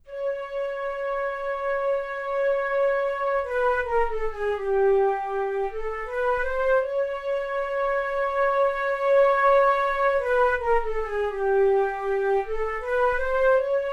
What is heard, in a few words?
ensemble far mic; EastWest